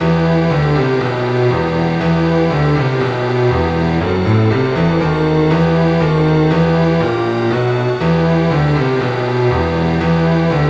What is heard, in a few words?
Ruffian; Violin, cello, piano; synth